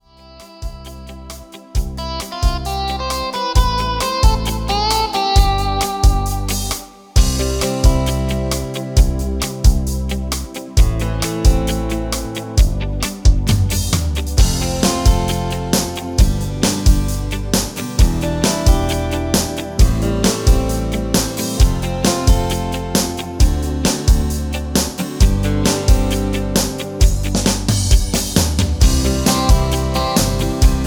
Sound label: Universe Rock